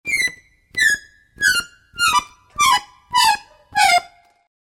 izmenenie situacii k hudshemu akkordeon

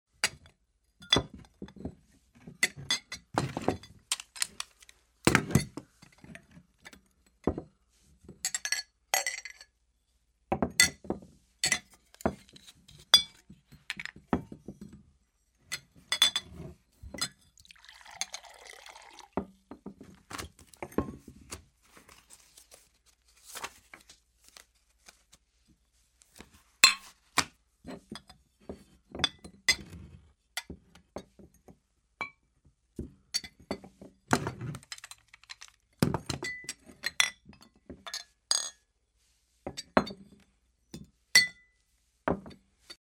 dlja atmosfery v bare

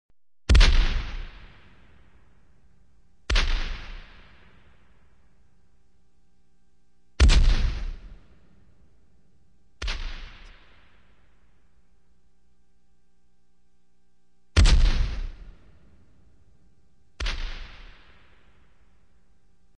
Dve pushki na rasstoianii streliaut

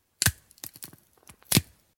dva zvuka perelomov

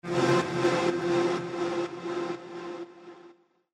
zvuk smyvanija kadra; Короткие звуки для монтажа — для transition, движения, смены кадра, звуки для выхода эффек

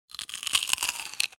medlennoe otkusyvanie

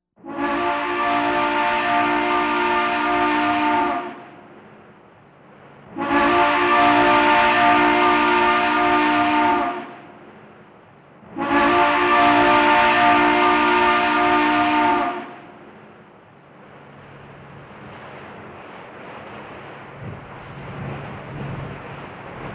gudok parohoda

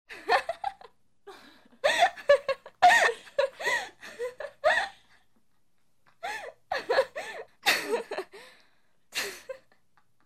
smeetsja podrostok

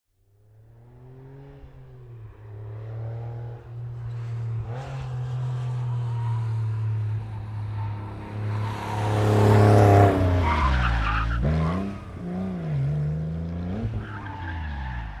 porshe turbo pronositsja s zanosom i otezd s vigom

